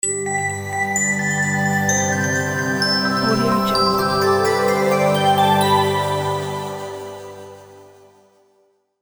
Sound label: arctic shine logo; logoprod